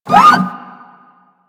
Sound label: Leg Servo; Warframe; machines; Jackal